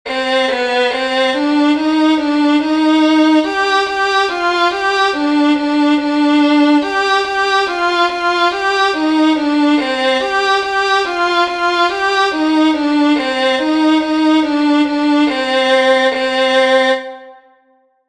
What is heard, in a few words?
hang